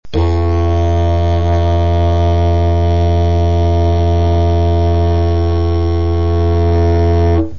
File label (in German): Grundton Yidaki